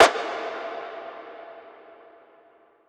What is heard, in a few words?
Impact (Blown); FX